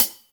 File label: Cl HH, Krunk; FPC